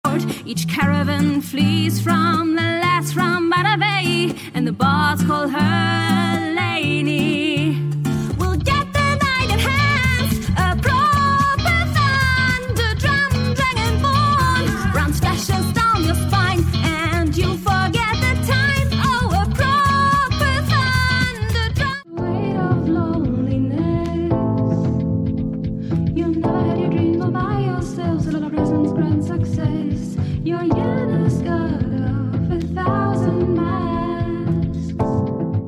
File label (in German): Gesangsreel